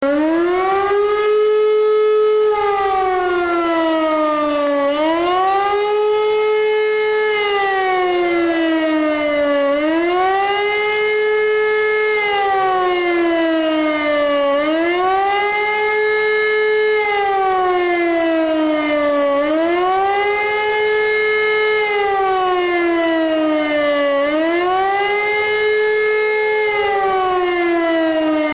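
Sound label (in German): Bevölkerungsalarm, Alarmierung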